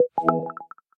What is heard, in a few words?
success notification